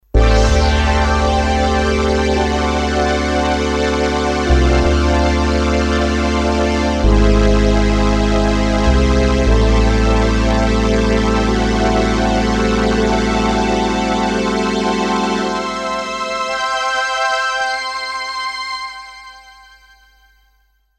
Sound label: layer